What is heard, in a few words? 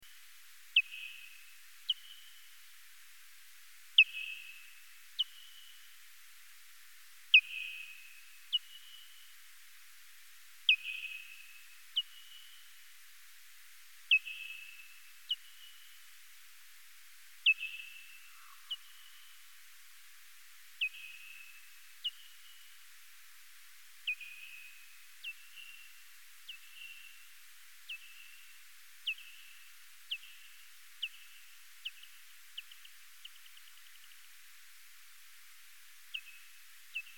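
Chalinolobus dwyeri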